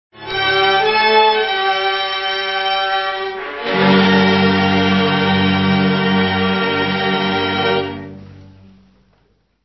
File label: violins